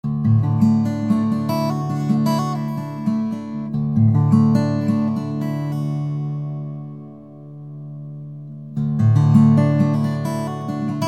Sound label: Guitar